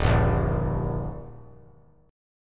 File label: D sharp Major D sharp, chord; strummed; 3,2,1,1; JGuitar